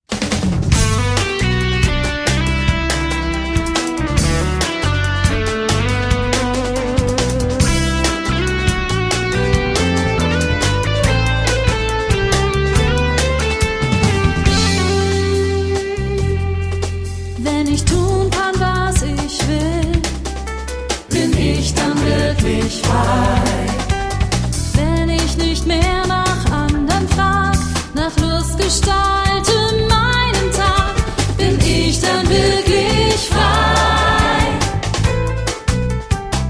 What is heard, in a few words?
Vocals